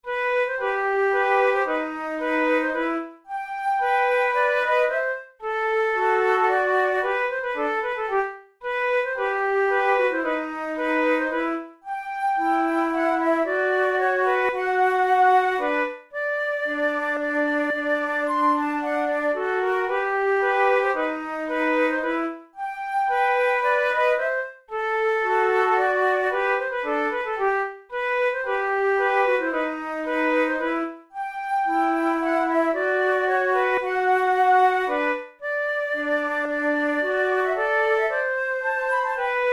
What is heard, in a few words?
methode de flute; tulou